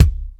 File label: 2000s raw reggae kickdrum sample c sharp key